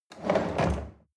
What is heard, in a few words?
chair wheel roll on floor medium